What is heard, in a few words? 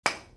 thonk